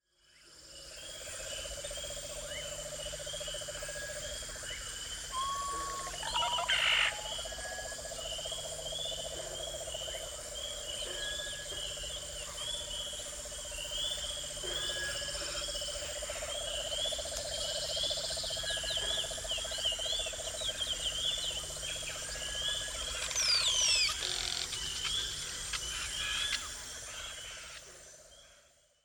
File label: Songs of the Jungle